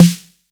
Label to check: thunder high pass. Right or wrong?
Wrong